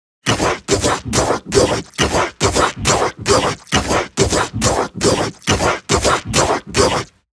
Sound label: 138 Shamanic Voice (Dry), Psytrance; Shamanic Voices